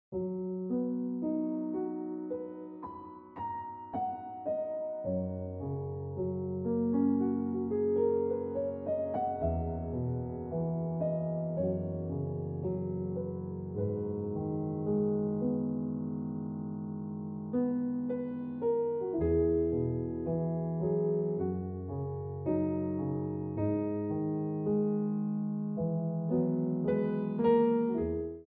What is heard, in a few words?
Ringtone